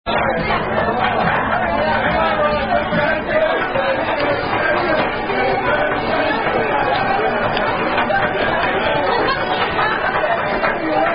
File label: Signs Indian Drinking Game; Thumper